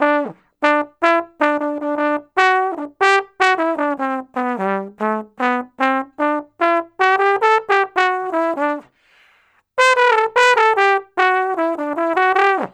Trombone Solo, Jazz

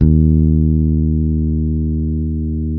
bass, sustain; Sampleheads; Will Lee Bass Library

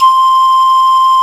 M1 PANFL; Best Service; Alpine Volksmusik; BRASS+BASS

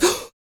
FEM PERC, Vocal Jazz FX

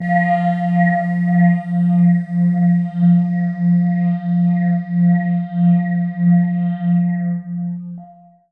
Wah Bell F, Bright; Trance Formation

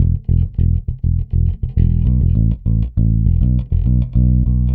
Pop, Bass

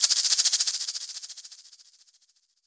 Egg End Off, shakers

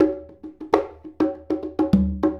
Bonga; Drums; Bongos; Essential Percussion

